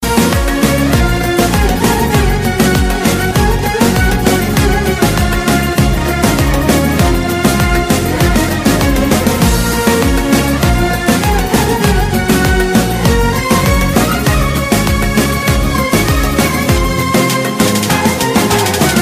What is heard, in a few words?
Greek oriental